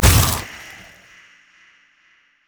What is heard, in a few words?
crystalize; LoL; anivia